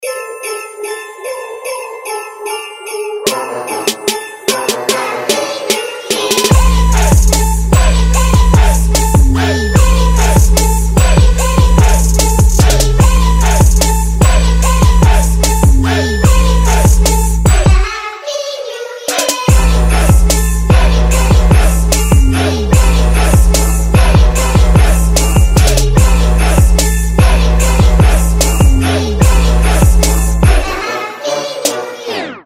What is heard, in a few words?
rington christmas trap